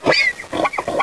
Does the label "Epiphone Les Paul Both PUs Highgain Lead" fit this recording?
No